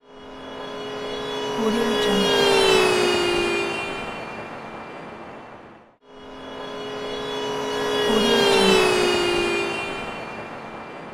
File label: car horn whoosh